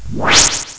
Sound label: Points Multiplier; Monkey Shines 1.2.1